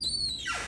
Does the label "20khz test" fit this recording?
No